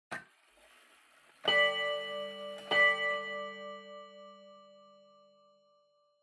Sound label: Pendulum Clock Chime Mid